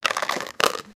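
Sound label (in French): son pour jeu vidéo, ice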